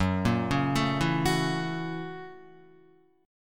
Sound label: F sharp 7th Sharp 9th, Guitar; arpeggiated; JGuitar